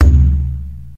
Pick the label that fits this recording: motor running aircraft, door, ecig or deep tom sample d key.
deep tom sample d key